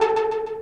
synth

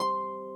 harp

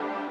lead

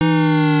8bit

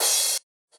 crash

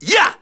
SE GAME BREAK; maimai

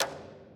orch percus

